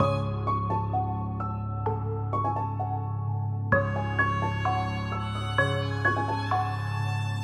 keys, sad loops

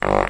fart short

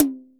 Toms, Real

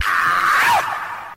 growl, Voice; ooh ahh

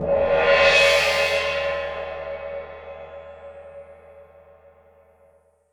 gong

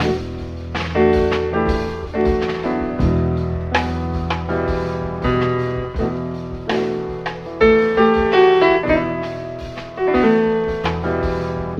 piano, Sad

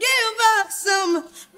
give us some SUNG

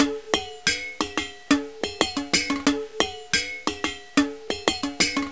coffee can, Drum loops

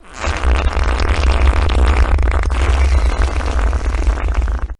fart medium

